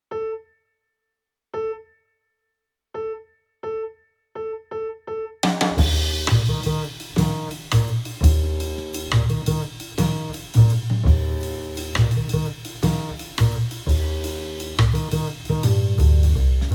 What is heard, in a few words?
Piano